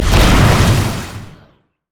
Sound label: surging tides; nami base